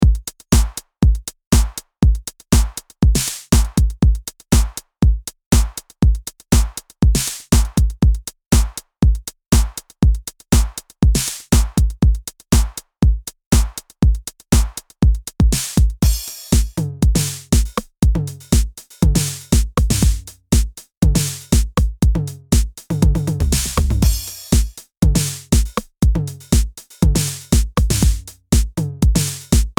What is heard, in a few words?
TR-909 solo, analog-inspired drum sounds